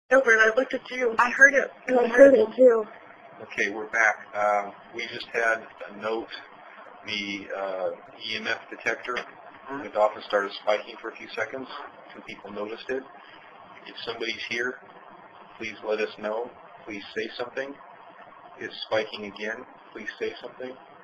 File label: dining room; ball state